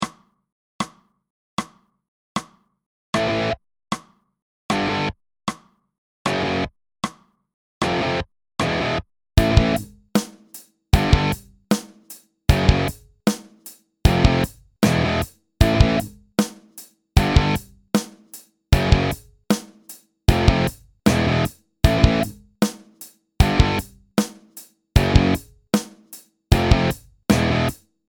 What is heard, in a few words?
4x with drums